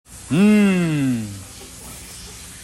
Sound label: mmmmh